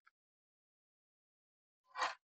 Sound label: sound of coins sliding; winchester; century ghost